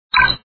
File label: phone; KUM KANG